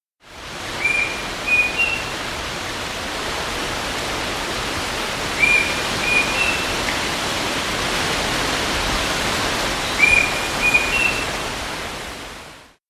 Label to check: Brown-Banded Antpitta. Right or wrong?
Right